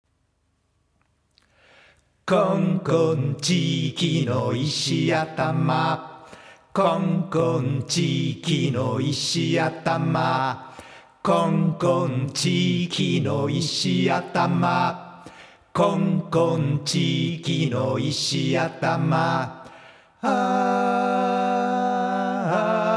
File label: chorus